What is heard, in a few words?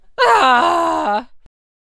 Lady Red Jade Death Vocals